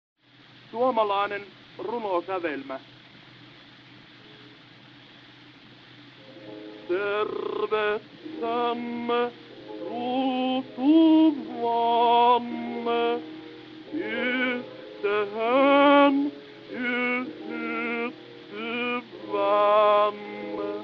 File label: Gramophone